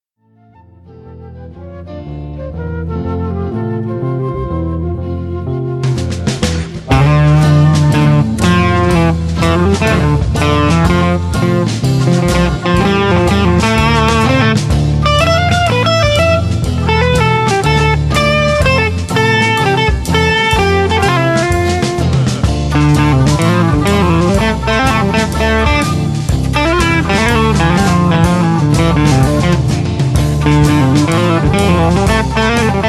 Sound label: bouree